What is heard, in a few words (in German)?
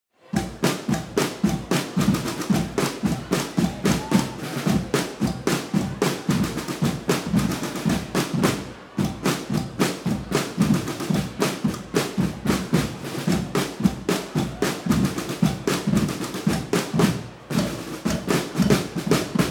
Scotch Rhythmus